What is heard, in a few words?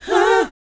BOYZ F, Vocal Planet R&B & Dance; ILIO